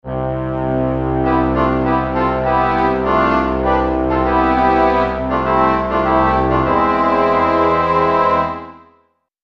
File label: Orchestra Mix